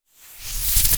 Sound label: Air can spin